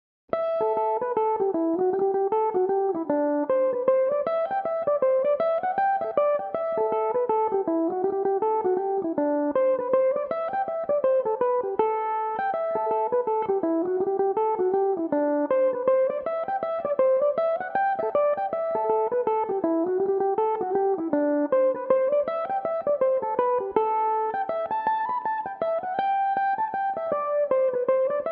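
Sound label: A dorian, irish mandolin, tunes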